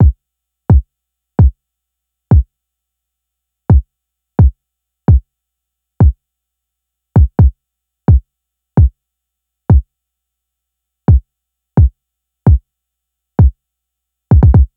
Kick

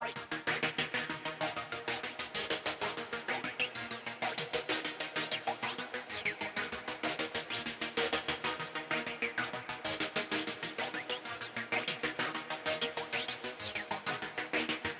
Gated

